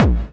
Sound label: BD, Dance Drums